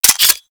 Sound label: Grenade Launcher Mech; Grineer; Weapons